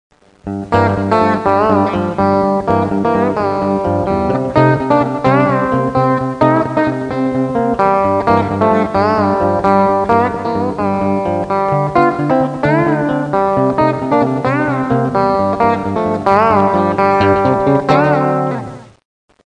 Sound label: country chop